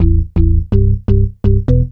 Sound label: bass 125; BASS LOOPS; House; Best Service ProSamples vol.10 - House; AKAI